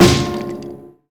Cello Smash; SFX Kit; Foley - Misc

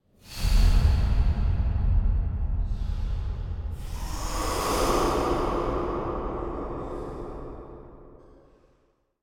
Breath Demon - Startling Distant Growl - Double; Hollywood Edge; sound designer's toolkit v2

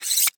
Syringe Plunger Back; Medical; Blastwave

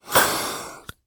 Inhaler Squeeze; Medical; Blastwave

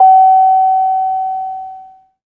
Auto, Cadillac Int, Seatbelt Warning Signal; Blind Drive

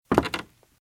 Footsteps - Wood - Creaky - Walk - Right

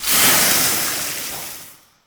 Steam Sizzle Acid Burn

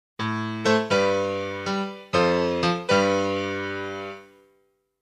Jingles; 90 DDD Jingles

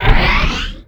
Pickup Scifi Energy

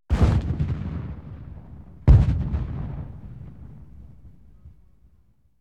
Tank M1 Firering + Impact; KMP Film Video Sound Effects

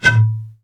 Blow Bottle Mortar Shot; SFX Kit; Weapons

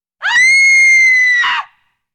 Shrill Scream Woman; horror sounds; Hollywood Edge

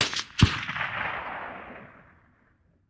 Machine Gun; Sound Ideas; Guns

